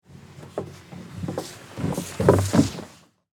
Tube Slide, Industry, Playground, Tube, Slide, Human, Slide, Down; BlastWave